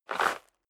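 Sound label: step dress boots gravel light slide short, footsteps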